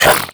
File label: grunt, headcrab, creatures; headcrab classic; vox